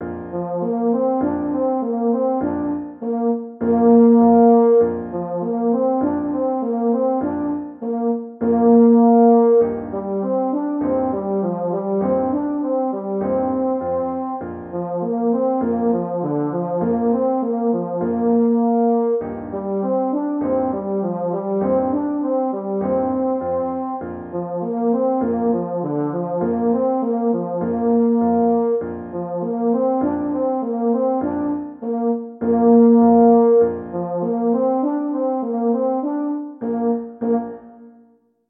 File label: EUPH, euphonium